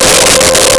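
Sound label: Synth Brass C5; Nintendo; July 2020 Gigaleak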